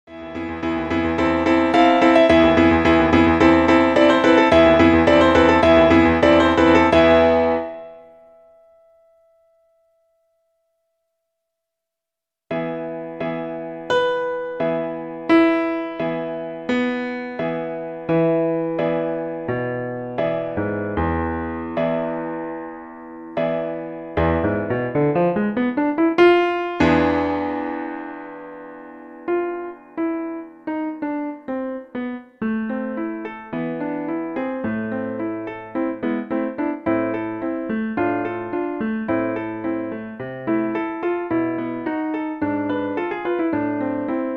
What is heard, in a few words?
arpeggione sonata